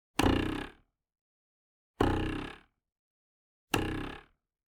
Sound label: Cartoon Boing